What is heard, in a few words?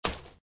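chip, casino; HighOrLow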